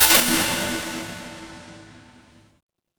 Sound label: magic scifi portal